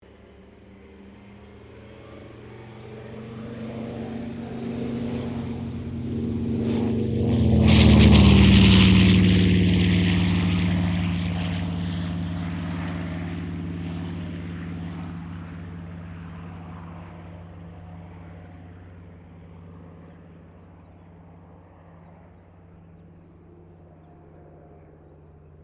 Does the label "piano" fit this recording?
No